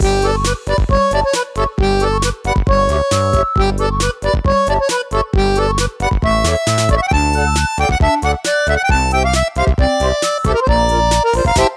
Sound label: Ramble loop; PeriTune Material